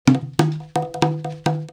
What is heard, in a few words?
Loop